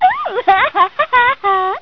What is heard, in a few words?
haha